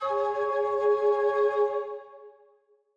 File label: G Sharp, Player Instrument; Yoke Idol